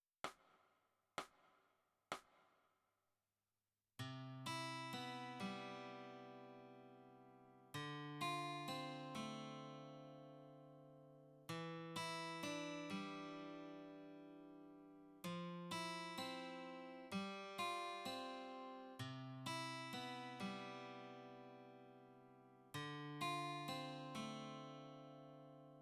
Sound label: Guitar